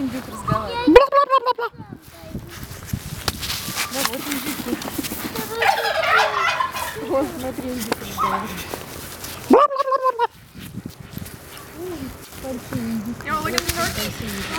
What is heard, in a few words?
gobble gobble; yaroslavl